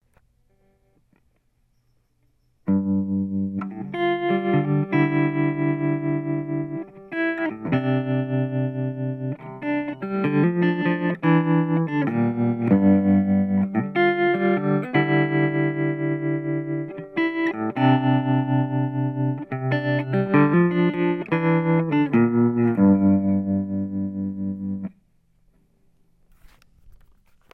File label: Warm Tremolo Twang